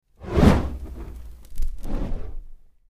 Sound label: Flame Whoosh Swift, fireballs